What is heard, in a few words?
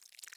slosh